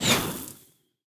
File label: roman ranged bow projectile; smite; npc; roman archer